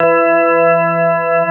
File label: CX3 ORG3E3, Keyboards Old School; USB Soundscan vol.09; AKAI